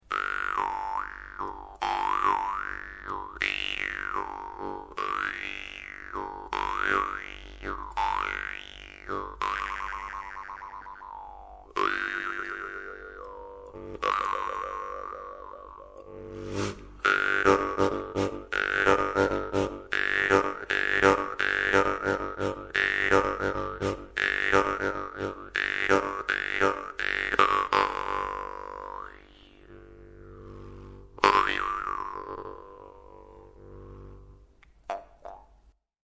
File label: parmupilli G, Parmupill bass, vargan